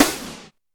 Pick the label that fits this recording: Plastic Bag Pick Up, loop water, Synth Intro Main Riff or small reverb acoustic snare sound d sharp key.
small reverb acoustic snare sound d sharp key